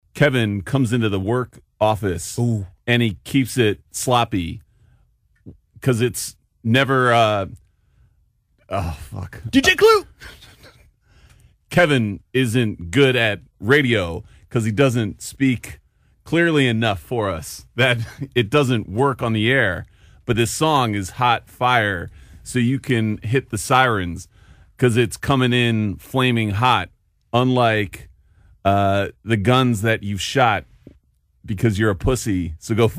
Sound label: rap